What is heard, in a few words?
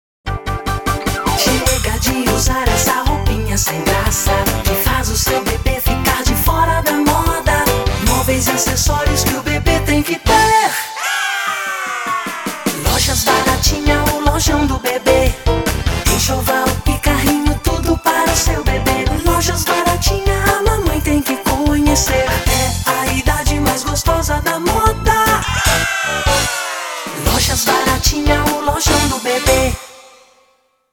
jingle